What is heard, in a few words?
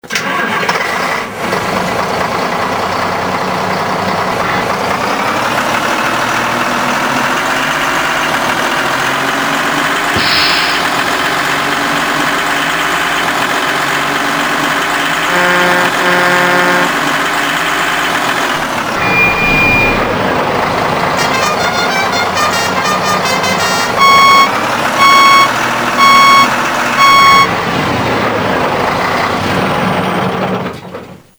Truck MAN; Soundbeispiele